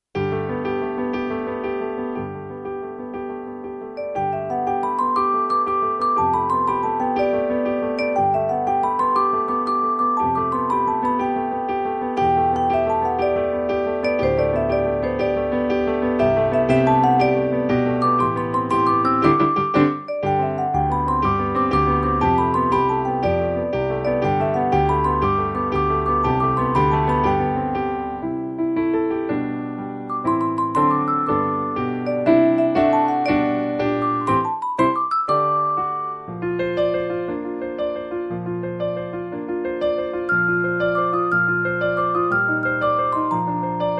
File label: Playful Pony Flute Melody and Accomp